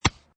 Tennis Ball Hit Net Rim; Sports

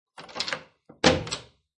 Wooden Door Close; Miscellaneous Door Sound Effects; Lynne Music

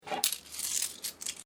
Coins Collected; Common coins; Money; Objects

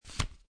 Playing Cards Slide One Card Off Top Of Deck; Card Sound Effects

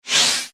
Space Door Close; Scifi, Space, Ghost, Moan, Bend, Eerie, Science, Fiction; Spacecraft; BlastWave

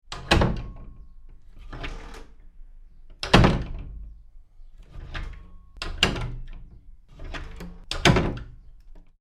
Wooden House Door Open and Close; Tom Hutchings

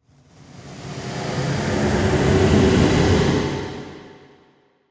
Breathy And Scary Transition Effect; Scary Soundscapes

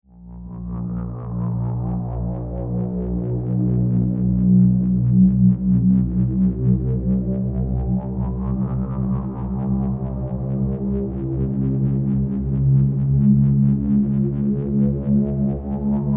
Slow ascending ominous drone transition.; Drones; Sound Design

